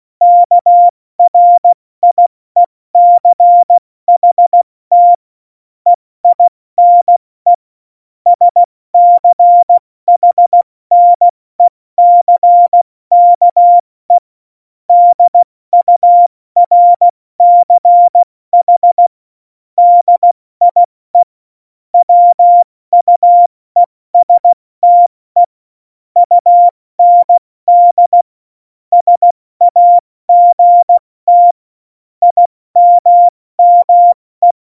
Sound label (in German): Witz, Morsekurs, 70 F80; Witze